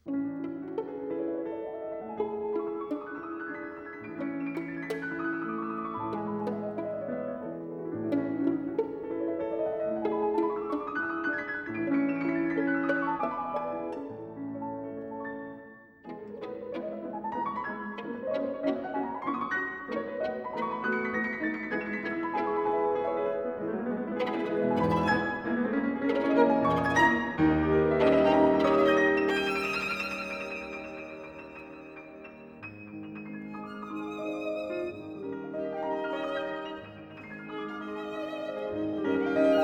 for violin & piano

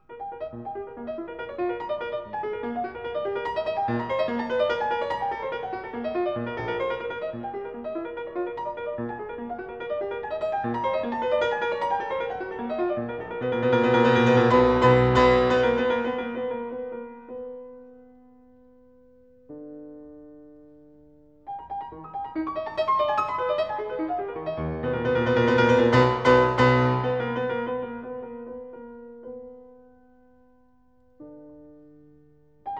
Variations and fugue for piano in E flat major; "Prometheus"; "Eroica"; Beethoven

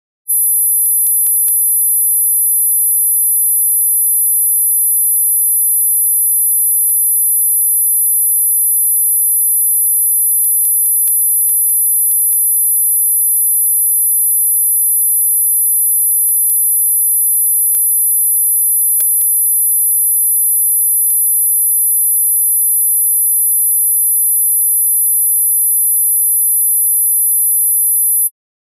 11khz+12khz 0db L [11khz: 12khz (1: 1)]; Denon Audio Technical CD

